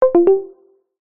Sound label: notification tone soft subtle